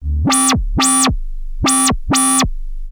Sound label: Monotron Sci-Fi